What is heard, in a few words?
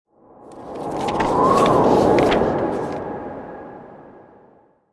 Flipping book in wind; ورق خوردن کاغذ کتاب در باد